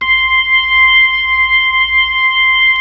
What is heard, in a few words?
B-3 Club Xfade, Keyboards of The 60's and 70's